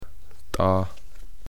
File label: Elk